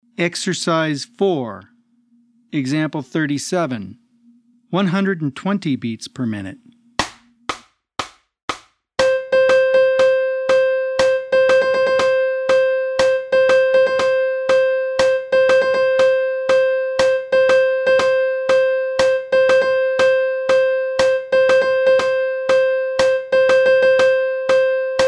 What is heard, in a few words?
120 BPM